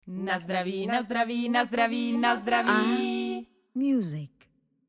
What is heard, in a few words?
instrumental